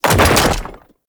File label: Archgun React; Warframe; Weapons; Archwing; Tn Concrete Archgun